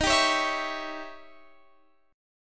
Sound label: D sharp 7th Flat 9th Guitar Open F; D#7b9; strummed